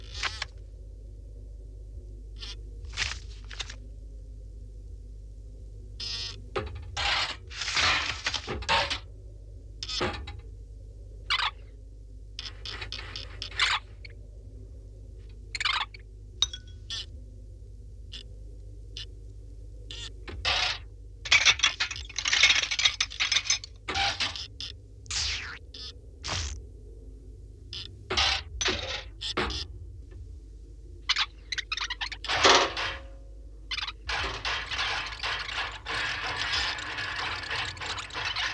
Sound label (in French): petite symphonie des bruits; GRANDE EXCURSION